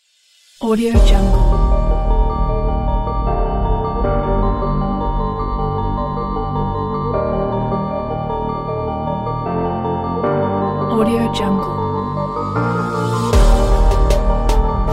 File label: trap